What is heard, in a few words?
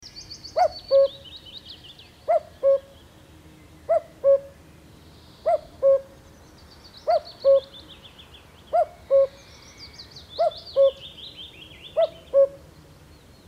common cuckoo cuculus canorus